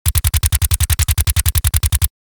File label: MP5 submachine gun suppressed burst; war